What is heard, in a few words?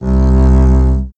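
double bass c; acoustic; acustica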